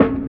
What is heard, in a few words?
FILTER SN; East West; Phat & Phunky